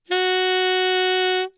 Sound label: G sharp 5, saxophone, tenor